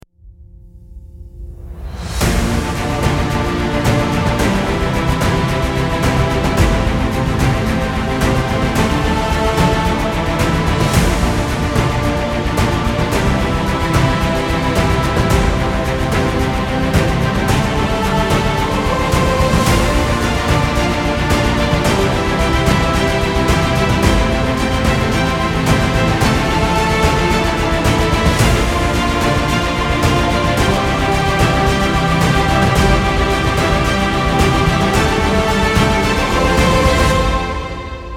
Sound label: Inspiring Trailer